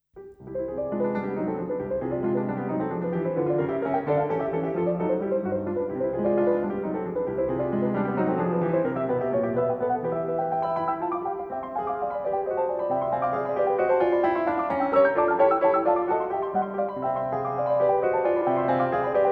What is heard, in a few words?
Op. 10 No. 7 in C Major, Piano